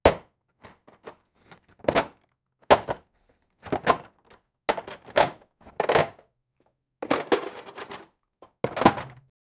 rhythmic grabbing and put, packing

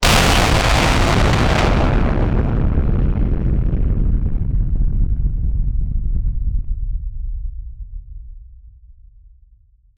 sounds of hellfire

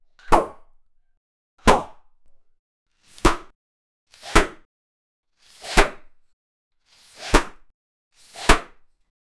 martial arts training

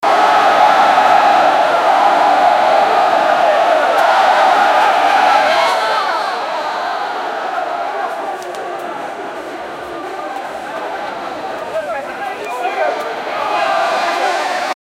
crowd chang fight fight

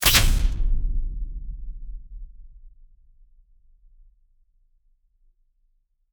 punchy fluid metal weapon

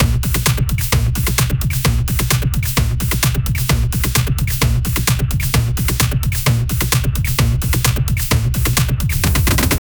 a high energy upbeat dj, upbeat; a high energy upbeat dj m

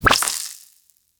gentle fizz and pop with, potion

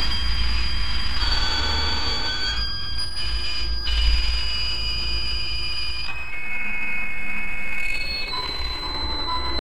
experimental minimalist, architecture

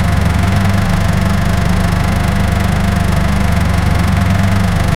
looping sound of a runnin, jeep

